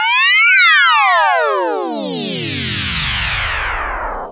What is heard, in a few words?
laser; robotron